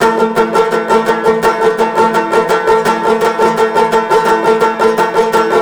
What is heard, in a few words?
banjo; zombat; danger